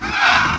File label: hurt; mahlendur